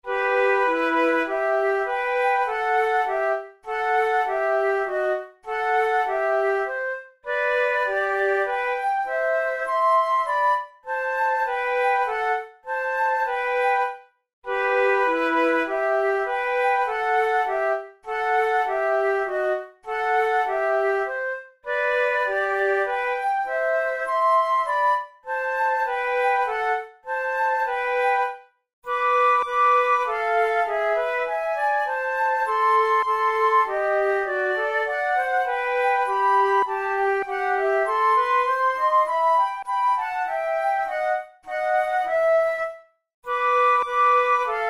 duos grazioso, flute; devienne; nouvelle methode pour la flute 18

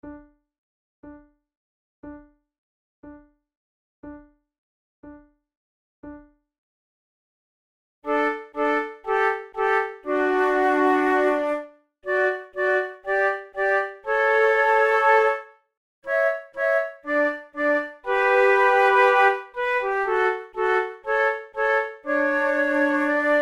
andante trio, flute tunes

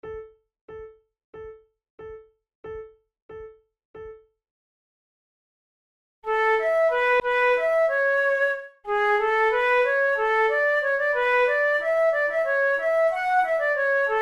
allemande, flute

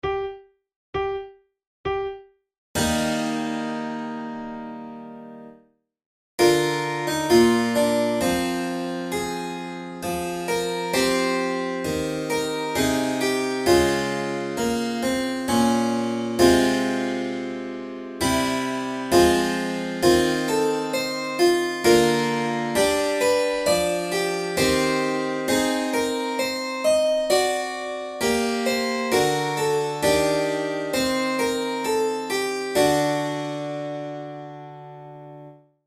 recorder sonata in g minor adagio

